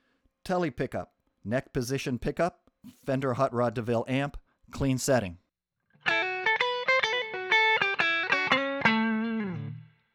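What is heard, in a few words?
Telecaster neck hotrod clean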